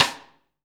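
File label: Snare Drum Rim; Best Service Gigapack 2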